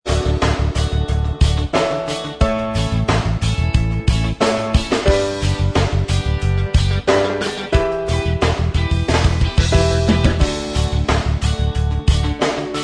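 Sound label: reggae style instrumental track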